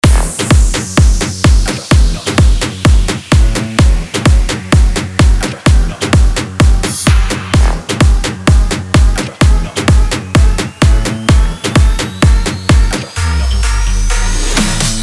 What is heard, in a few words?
Mashup; reverse impact lockdown mashup pack 2021